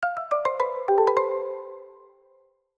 木琴のアイキャッチ

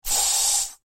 スプレーの音

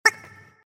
小さな子が履いている靴の音

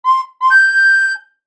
リコーダー

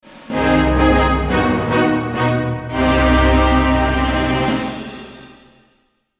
不穏なファンファーレ

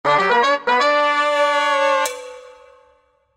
失敗した時のファンファーレ

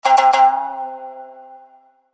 三味線

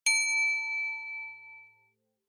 卓上ベル・カウンターベル

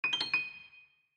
何かを発見した時の音

